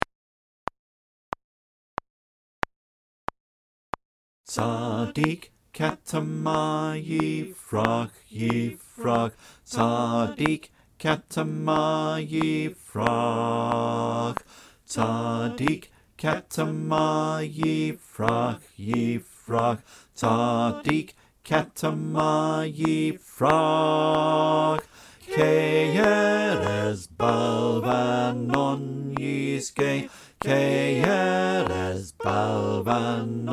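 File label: Bass clicktrack, choir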